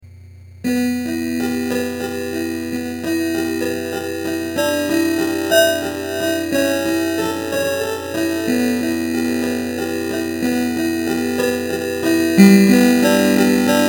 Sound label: piano quadbox